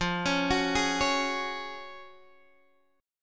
Gb Major 7th Suspended 2nd F, chord

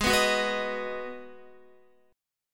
G sharp Minor 7th G sharp, Guitar; G#m7 strummed; JGuitar

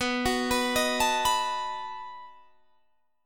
B 7th B down Guitar Standard; B7 arpeggiated; JGuitar

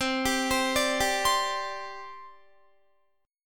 C Suspended 2nd, C, down, Guitar; Csus2; arpeggiated

